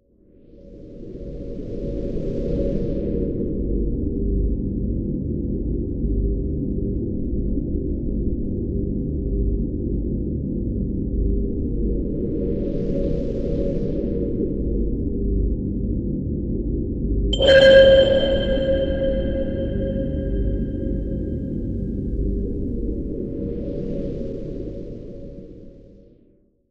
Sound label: Corpse Plague